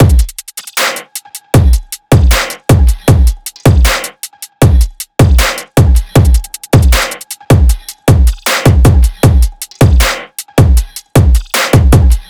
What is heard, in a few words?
drums 156, Full Drum Loops; drum sample pack; Krafla; SEISMIC; Slate